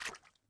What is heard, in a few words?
fs mud, footsteps